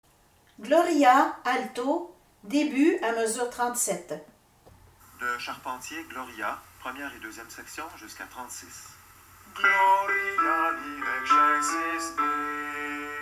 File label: gloria alto; charpentier